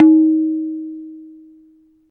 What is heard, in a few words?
tabla tun